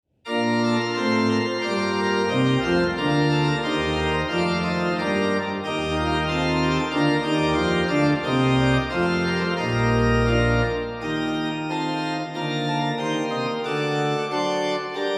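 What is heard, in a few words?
improvisation alla rondo, organist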